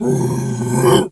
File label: bear roar; Infernal Radiation